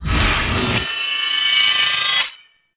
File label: Heavy Hammer Attack Charge; Warframe; Duviri; Dax; Weapons